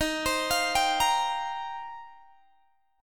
D sharp 6th Add 9th, D sharp, down, Guitar, Standard; arpeggiated; JGuitar